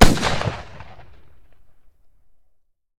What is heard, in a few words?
fire dist; weapons; m1014; Urban-Coalition; Decay; arccw ud